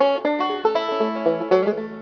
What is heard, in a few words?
banjo CCITT a-law 8 bits 11 kHz; telecommunications; numerisation; berthet; module numerisation